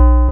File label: MISC, Skanky Perc